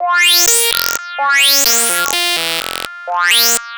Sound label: Synths, Underground UK House & Electro